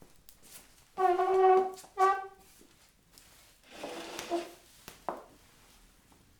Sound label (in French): chaises